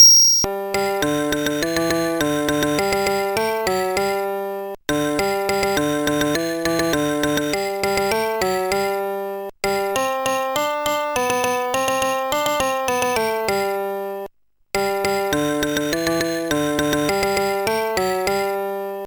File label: Old King Cole, Music Box